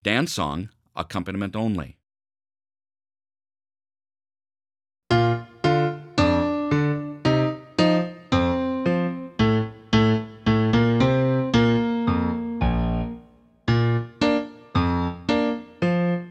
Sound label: dance song accompaniment only not horn